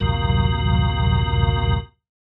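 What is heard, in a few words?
organ stab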